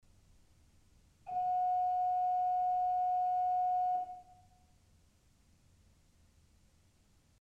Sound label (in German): Principal 4' fis1, ORGEL pipes